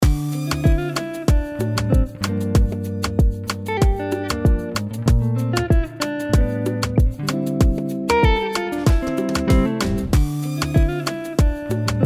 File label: Sample, African guitar samples